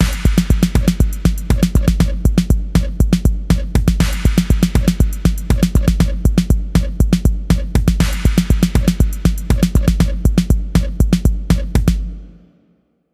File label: energy beats